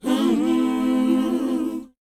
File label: WHOA G; Spectrasonics; Vocal Planet; R&B Dance; WHOA OO